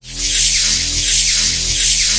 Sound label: bfg charge, weapons; tf2classic